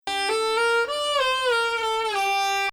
Nahawand; Nahawand 4; Samai Bayati il-3ariyan

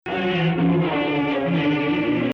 Rast Sihtu Nakriz

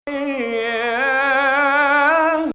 Hijaz Octave

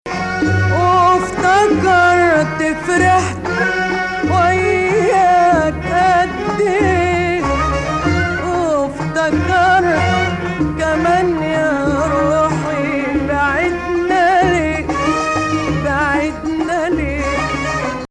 Nahawand (Ajam; Ajam emp

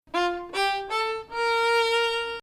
Bayati Ajam 6, Maqam Bayati; 2nd Ajam 3/6

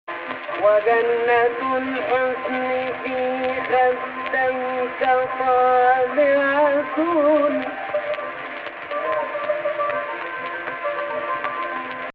AHH, Egypt Rast

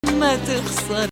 Hijaz

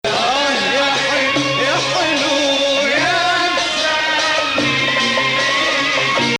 Bayati Ajam - Nahawand; alternate parsing

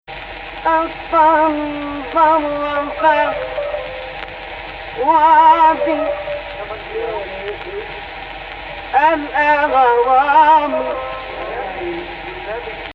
AHH, Egypt Rast; Jins Rast in the upper octave; Actual Key: Rast on C

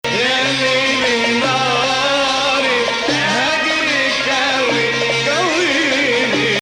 Bayati Jiharkah; (or 2nd ajam 3/6)

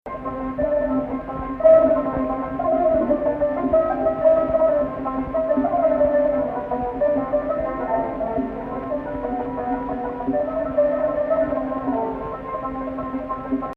Rast Octave; Maqam Rast

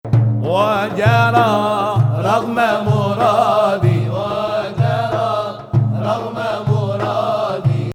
Ajam Nahawand

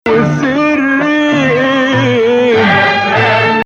Nawa Bayati

